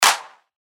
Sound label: Clap; Kakuna; revealed claps snares vol 2